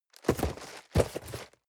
cardboard box medium turn hard short; objekte

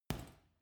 boxing punching bag side hook soft; sport freizeit; boxen

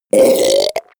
goblin wet burp nasty; koerpergeraeusche; comedy-cartoon; soundpool

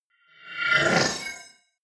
swell short haunted; sweeps-swells

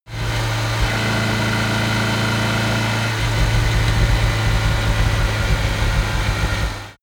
car dacia driving slow down

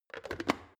plastic water boiler lid on; soundpool

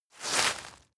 step walk graze leaves

